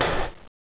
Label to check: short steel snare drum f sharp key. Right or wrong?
Wrong